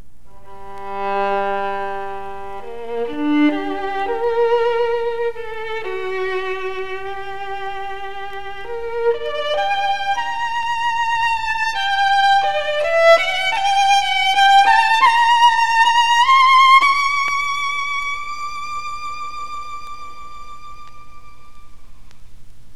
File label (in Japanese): Guarneri, 擦弦; 弦鳴楽器